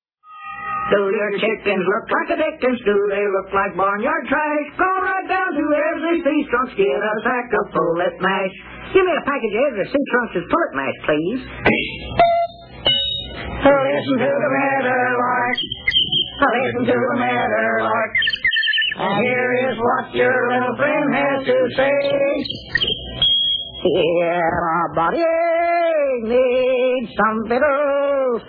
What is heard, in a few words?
Singin' Commercials; Lum And Abner; OTR